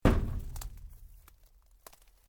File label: PLASTIC PIPE LARGE STRIKE; Material components for constructing more complex sounds